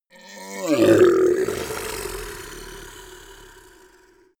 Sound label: monster dark growl evil; kreaturen